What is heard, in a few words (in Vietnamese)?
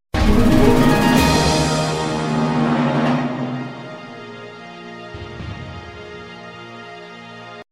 Am thanh tra loi dung; ai la trieu phu